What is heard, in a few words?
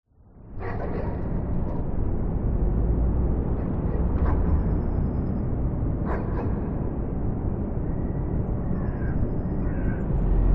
warehouse abandoned drone distant traffic birds dogs